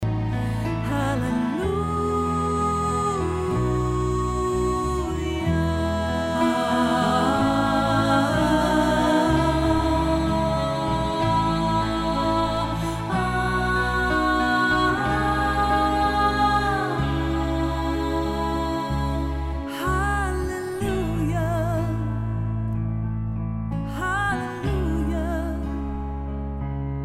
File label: melody harmony